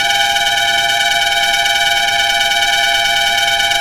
malfunction ventilation loop; room 2; ambient

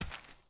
Footsteps; DOTSSample

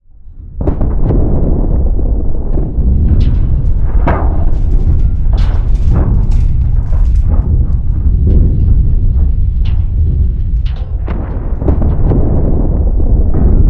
combat explosions debris lowpass; Attack; SpaceshipDemo

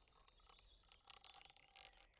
forest motion, Night; FontainebleauDemo

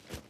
Movement Clothes; DOTSSample

